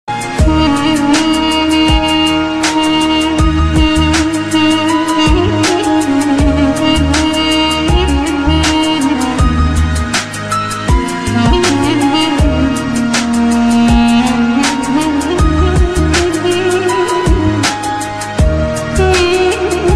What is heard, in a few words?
Duduk armenian